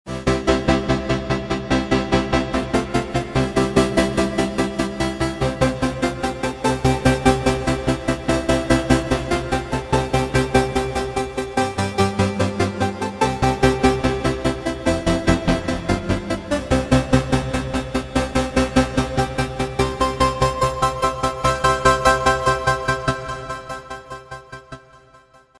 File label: Loop Dance